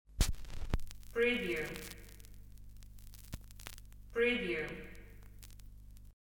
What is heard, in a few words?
record needle set down